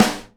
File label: SNARE DAMP, Live Snares